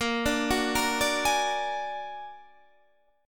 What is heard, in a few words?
Bb Augmented 7th Bb down Guitar Standard; Bb+7; arpeggiated; JGuitar